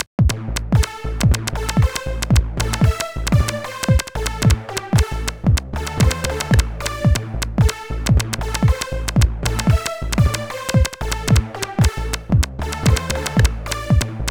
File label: MPC tests Rock